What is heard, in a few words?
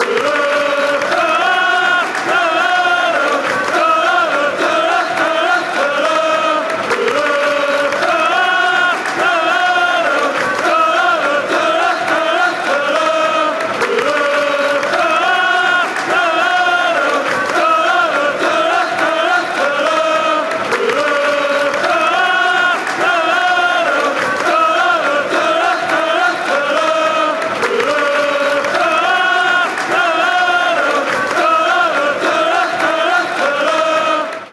before kick off song, chants